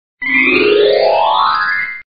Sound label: Magic wand; عصای جادویی شعبده بازی